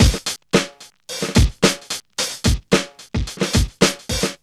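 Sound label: 110 bpm, Funky loops; Zero-G; Funky Elements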